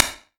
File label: Drum Sticks With A Spank Twist